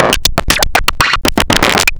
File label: Beat, Modular Electronica